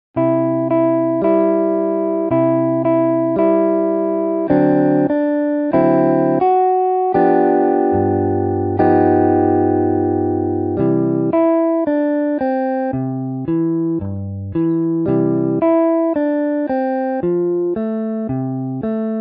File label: jingle bells guitar